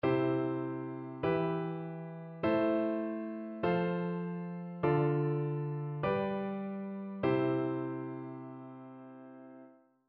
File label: harmonic dictation; aural skills i